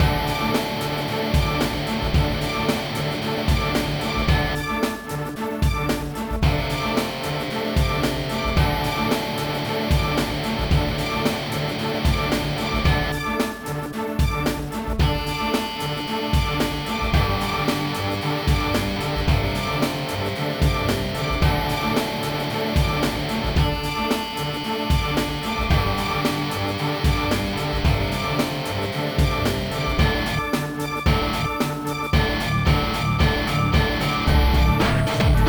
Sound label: Music Fight